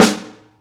Snare One-Shots, Drum n Bass; KJ Sawka; Drum n Bass & Beyond; Drum Tool Box

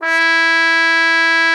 OCUP E4, CUP MUTE; Xsample VOL-3

